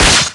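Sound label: Se Battle Whip; Etrian Odyssey I HD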